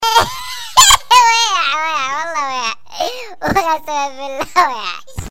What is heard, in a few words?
Laugh SMS